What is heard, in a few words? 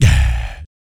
VOC PERC, Vocal Planet Jazz FX BASS PERC